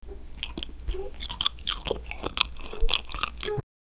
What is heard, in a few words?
eating